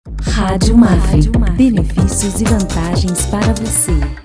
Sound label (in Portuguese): vinheta; mapfre